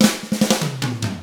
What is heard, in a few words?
rock 6/8, drums; ILIO; Double Platinum Drums 1